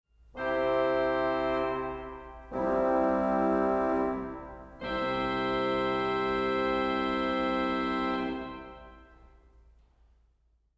Oboe 8

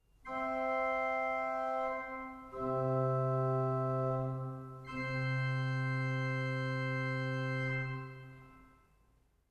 Flauto traverso 4

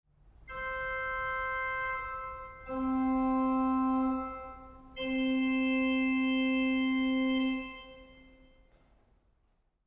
Piccolo 2

